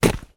footstep dry forest floor; foley

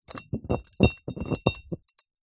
carboy demijohn roll hard ground; zapsplat

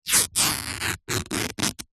foley clothes running shorts polyester rip

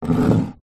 foley stone scrape wooden surface; zapsplat

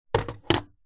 videogame controller xbox set down wood table

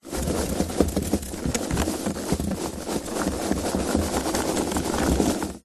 office foam packing peanuts box shake

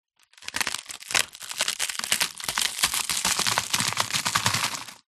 food crispbread squash crush on wood

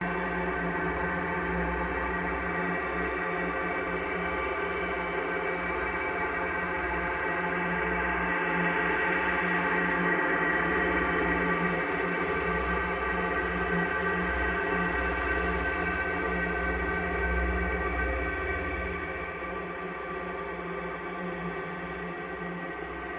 Drone; PMSFX

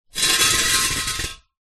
industrial gas cannister metal drag concrete

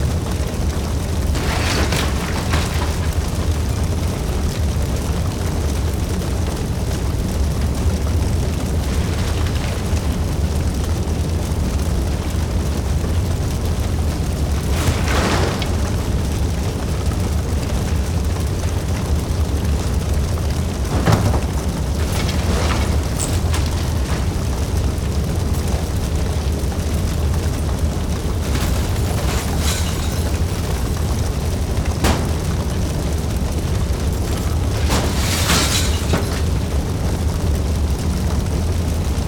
Building Fire Ambience; Blastwave FX

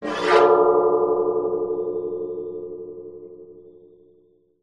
musical instrument gong gamelan scratch

